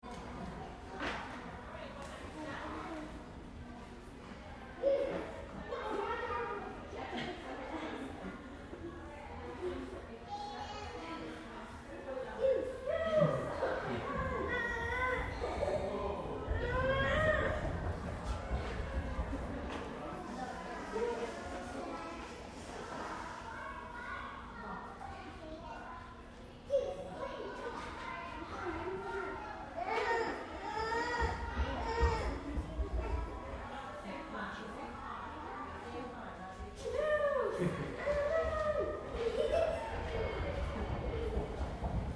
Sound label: Crowd Kids Gymnastics Lite Toys Teacher; Miscellaneous Sport; BigRoomSound